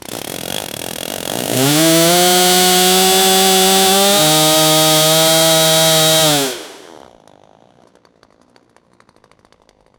slow big chainsaw; tool

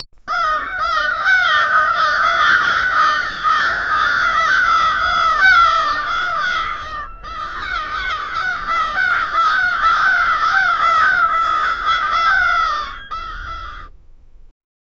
big crows stuck in a

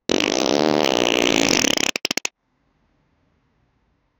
sound of a lot people, indoor

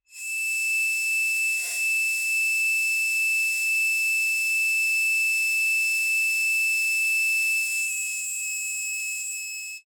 transition

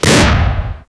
fire from burst pistol; desert

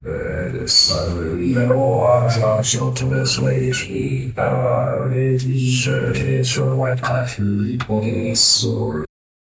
haunted house

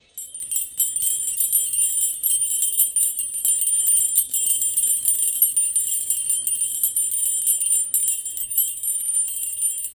sleigh bells growing loud, metallic chime

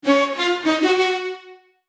victory fanfare

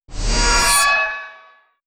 short cyberpunk dection a, alert

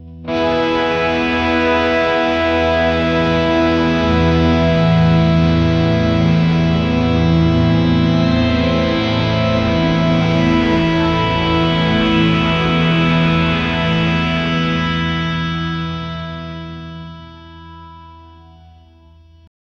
intense orchestral surge

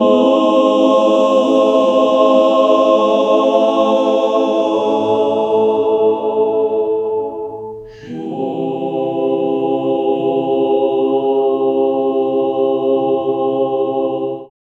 man vocal choir

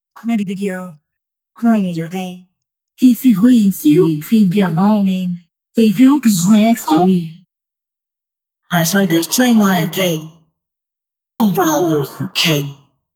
victory

